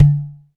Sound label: DM5 Perc, Alesis DM5 drum machine